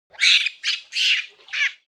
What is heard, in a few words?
Monkey excited screech; khi